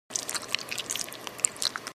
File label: Suck; Lewd SFX